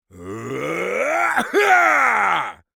fat male strong attack; Enemys

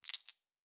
Objects Small, Fantasy Interface Sounds

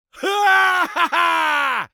male win; Enemys

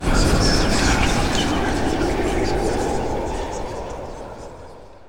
Explosion Ghost; KriptoFX; Realistic Effects Pack v1

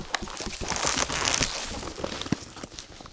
Growing trees; Nature

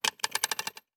Coins, Fantasy Interface Sounds

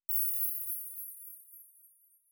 Magic Chimes, Fantasy Interface Sounds

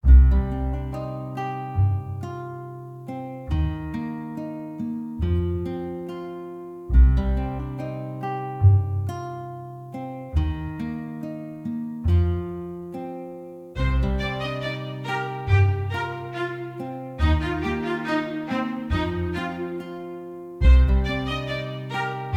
Music dialog

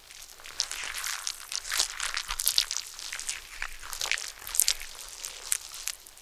Loop Blood; KriptoFX; Realistic Effects Pack v1

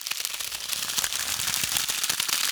Loop Ice; KriptoFX; Realistic Effects Pack v1; PARTICLES

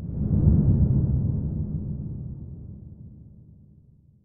In Wave; KriptoFX; Realistic Effects Pack v1

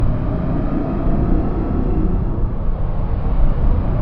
Loop Fire; KriptoFX; Realistic Effects Pack v1

